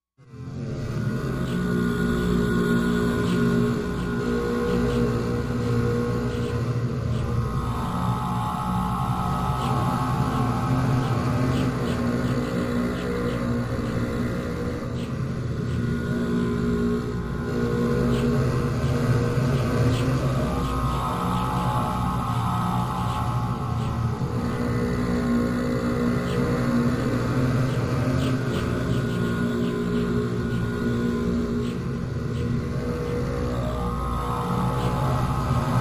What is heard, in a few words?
Drone Fear Deep Drone Mu, eerie ambience